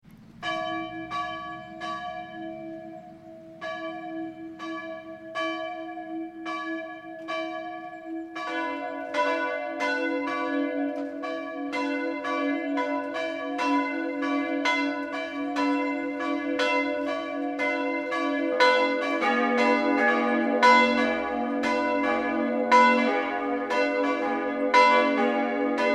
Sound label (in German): vollgeläute, kirche glocken; St Georgen